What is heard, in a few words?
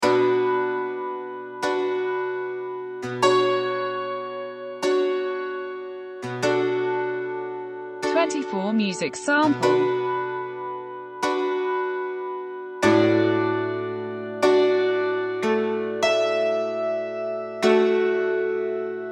hackbrett